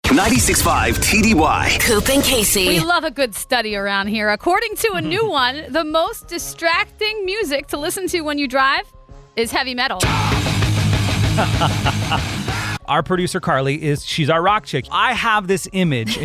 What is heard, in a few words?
promo driving music